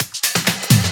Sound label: Fill Ins 128BPM; Vengeance Samples